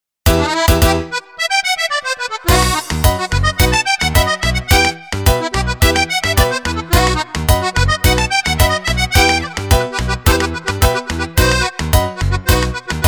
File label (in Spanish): Instrumental